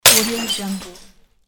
breaking glass hit

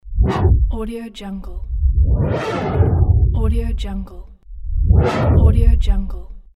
tech whoosh

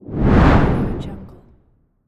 the fireball whoosh

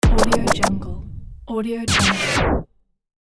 accented scratch

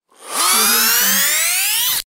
cosmic acceleration

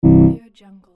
ui error

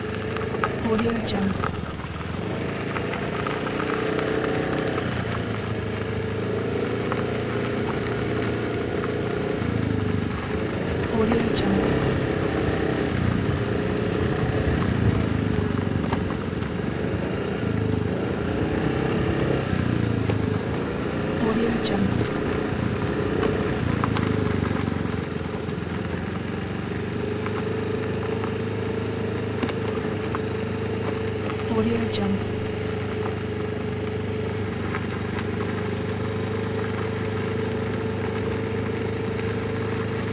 dirt road motorbike ride; lokohighman

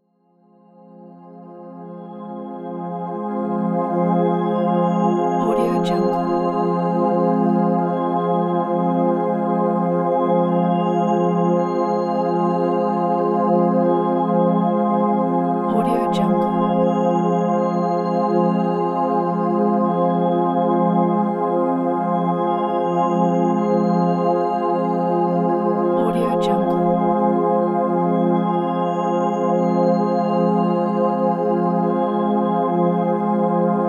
space

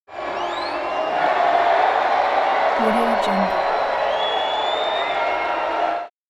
wrestling crowd

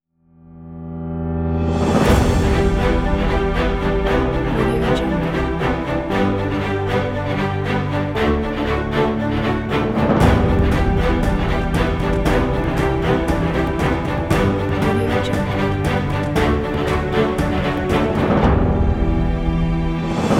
epic inspire motivational trailer

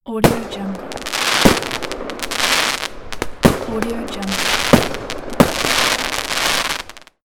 rocket shot

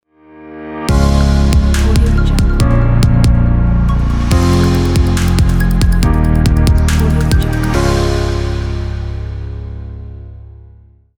tech logo

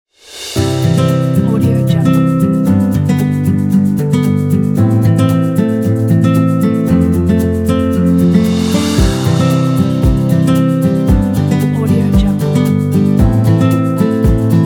acoustic guitar

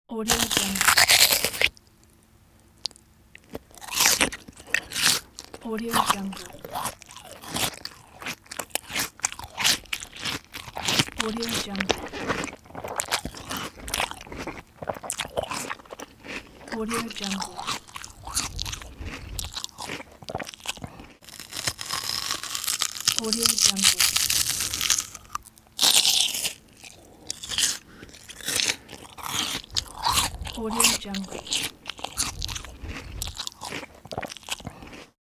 crunchy bites during eating an apple

